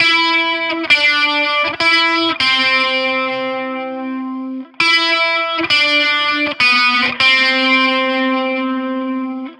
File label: Crystal Lead Guit A